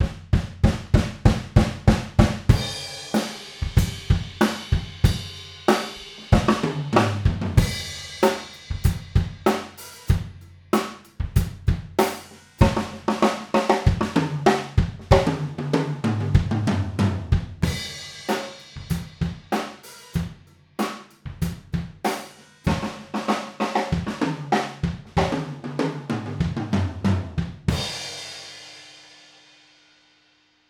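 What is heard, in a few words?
Room Mono Cardioid, drum; drum shootout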